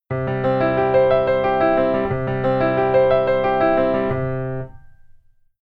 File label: Keyboard